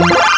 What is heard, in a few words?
organs